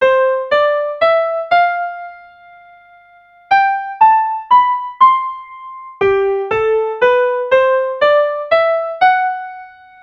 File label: from c maj to g maj